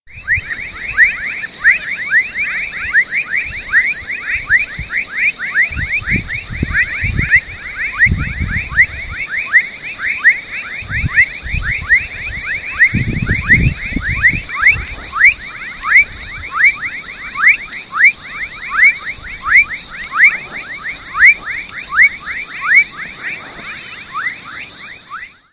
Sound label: Leptodactylus fuscus; Comandatuba